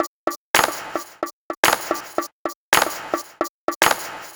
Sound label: Clap That, ударные и барабаны rnb soul